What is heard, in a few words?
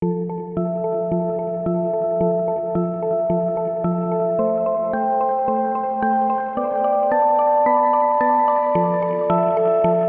Ethereal Mallets, alarms; Lenovo; ZUI 2